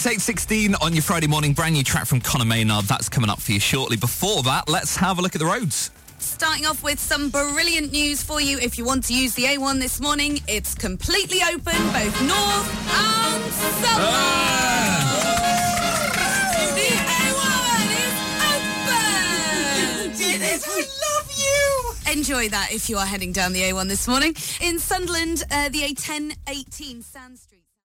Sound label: traffic celebration